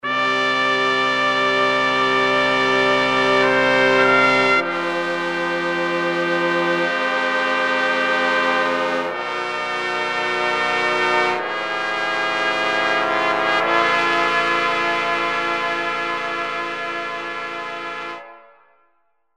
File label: Trumpet Song